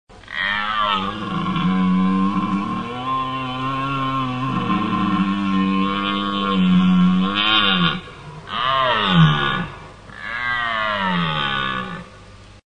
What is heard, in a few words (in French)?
cerf cri, cris d animaux